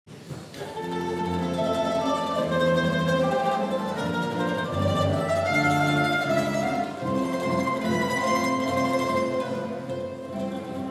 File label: Melodie in F